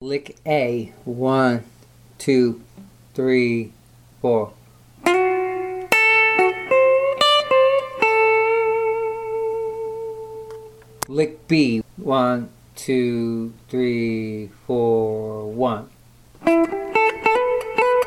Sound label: licks combining major and minor pentatonics